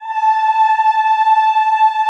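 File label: Choir A5, Ehh; Eeh + Ehh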